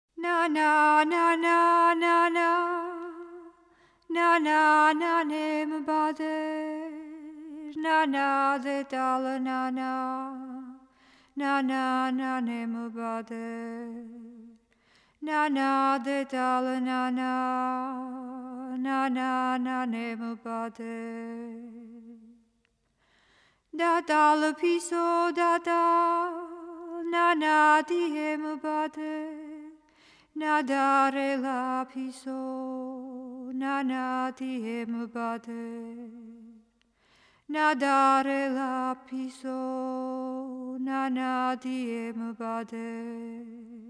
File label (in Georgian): nana akvnis dasaZinebeli tusheti; Mzetamze